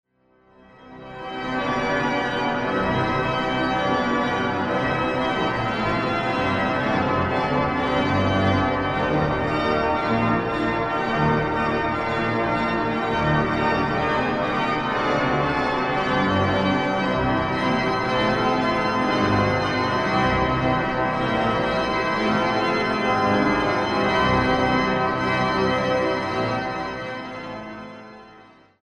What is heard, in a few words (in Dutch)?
Liedbewerking; Gouden Harpen Hoor Ik Ruisen